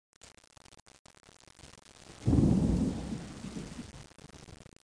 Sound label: STORM; ambient; weather